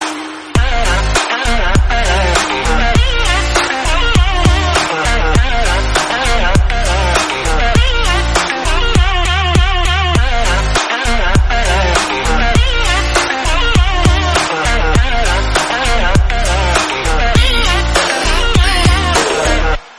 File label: Instrumental BGM